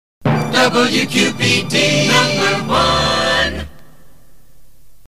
jingle Number One; WQPD

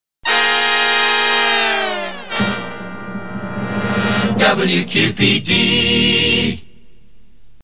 jingle short; WQPD

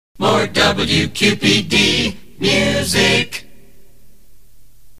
jingle More Music accapella; WQPD

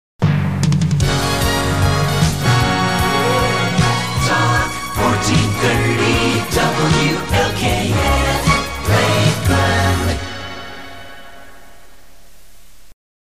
jingle Station ID; WLKF

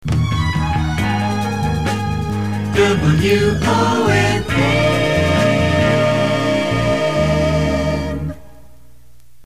jingle; WONN

